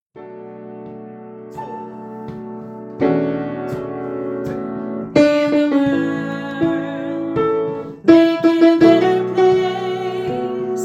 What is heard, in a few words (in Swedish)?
Sopran